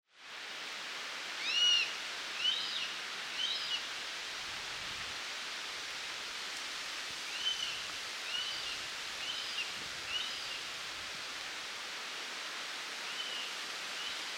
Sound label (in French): buse a queue barree